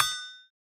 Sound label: bell key bbm